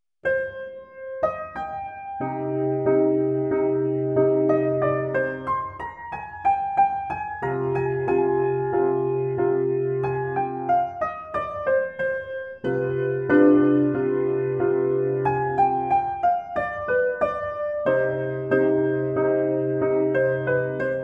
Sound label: Duo Vl Vla; Hoffmeister; Op.13-2